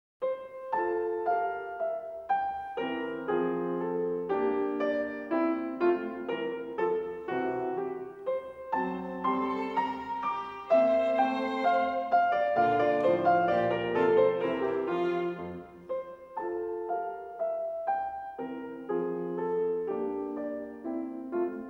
Violinsonate F-Dur - Tema, Andante

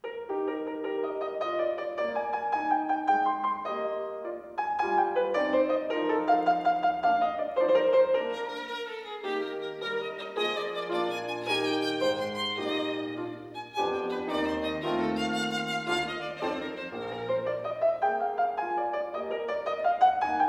Violinsonate Es-Dur - Variation VI, allegro; Mozart; Chamber Music; Violin Sonatas

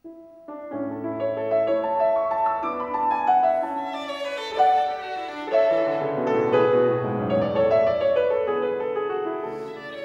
Violinsonate A-Dur - Variation III; Mozart; Chamber Music; Violin Sonatas

